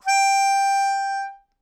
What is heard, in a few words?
Melodica Long, Long Notes Chromatic